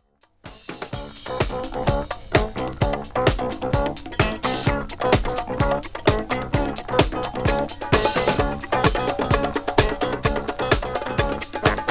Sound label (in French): MUSIQUE